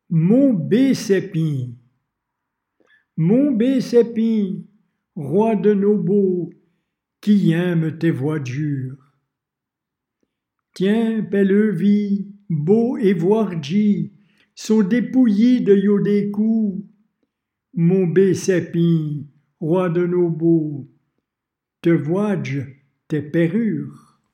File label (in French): mon beau sapin